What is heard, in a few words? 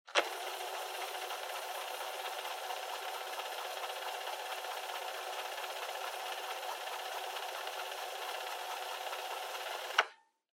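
Portable Cassette Driving Noise Fast Forward Stop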